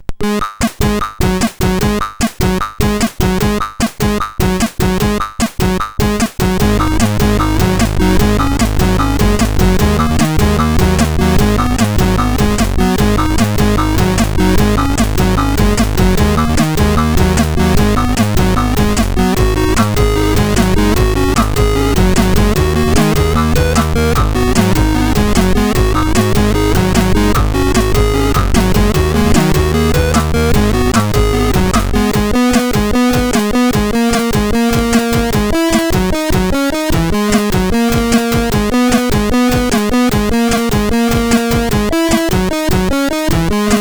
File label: Rock, sid CSG8580R5; TheRyk